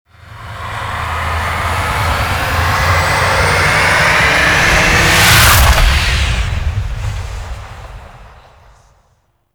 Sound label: Extraction Depart, Vehicles Flight; Warframe; Liset; Stalker Liset Skin